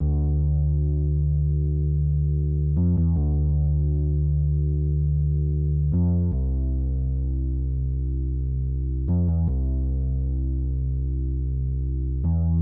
Walking Bass

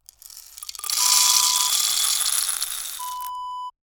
coffee beans pour

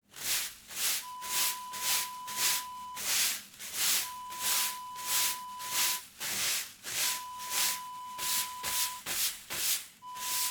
hand broom

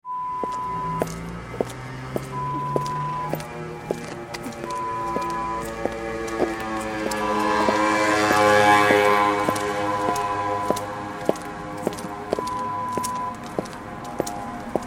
Footsteps street

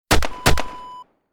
gun two shots

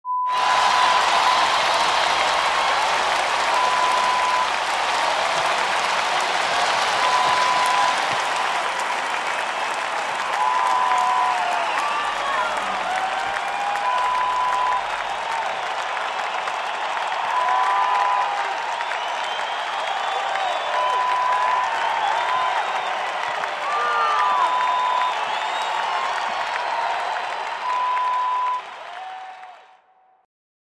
Stadium Cheers

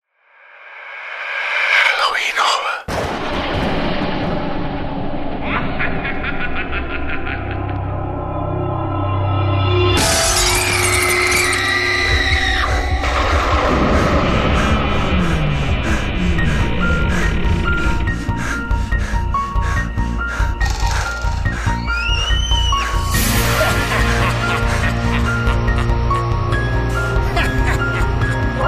Halloween Horror

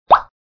bubble pop

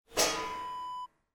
water tank lid open

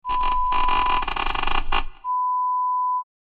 creaking door sound

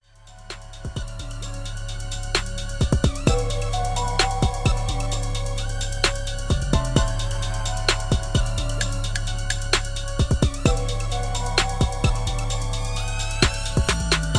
Instrumentals 130bpm

